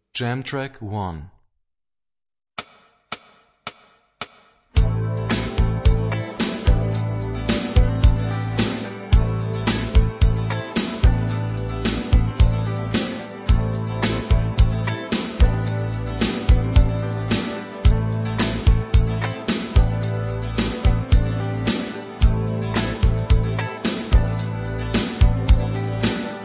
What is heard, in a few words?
Rock guitar; Rock gt Secrets